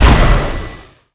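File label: boss explosion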